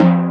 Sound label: timpani; cubic